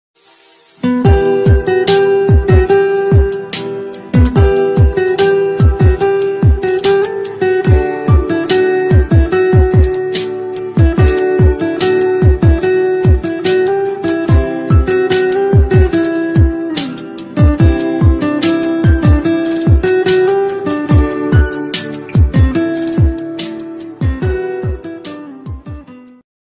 instrumental ringtone instrumental guitar cover ringtone